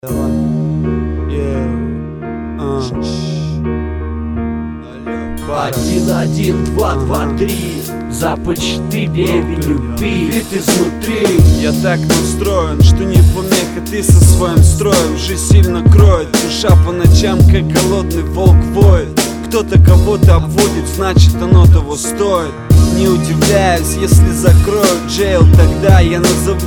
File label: beat; beat by karab